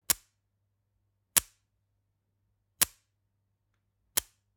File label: Generic Click Wood Small